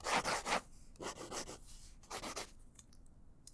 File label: Mech Pencil Lines; bb - Books, Paper, Writing